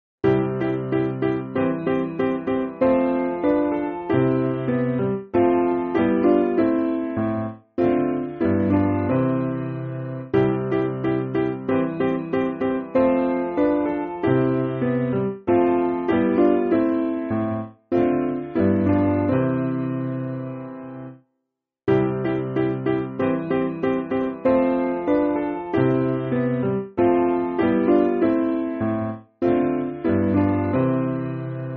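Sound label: Piano, Music Backing Files for Churches; Come In His Presence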